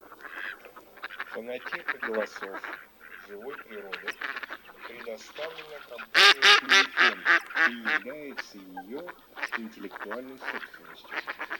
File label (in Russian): Кряква Germano; plurifon